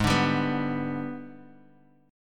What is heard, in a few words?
G sharp Minor Major 7th Flat 5th, Guitar Half Step Down